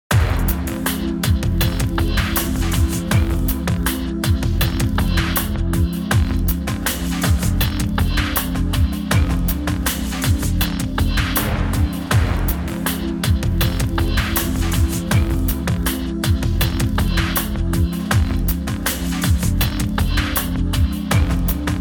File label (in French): Aventure Minimalist; Jingles medley